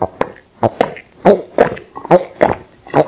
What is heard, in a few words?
drinking male